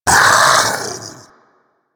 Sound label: Infested Splitter Split Vocal Pain